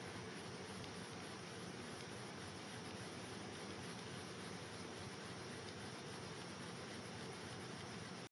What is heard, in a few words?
backwinder, Reel